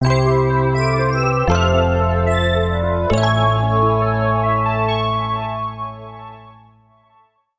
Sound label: Taking A Nap; paper mario the thousand year door; GameCube